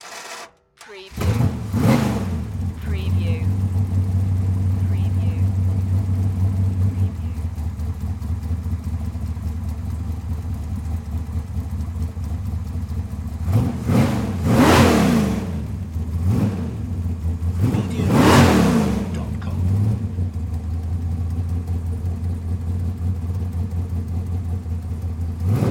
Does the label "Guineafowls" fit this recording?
No